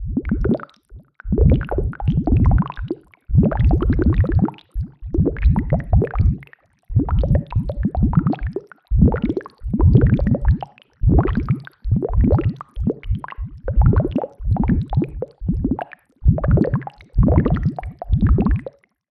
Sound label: Water Drop; Routed Sound